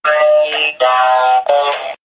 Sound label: radar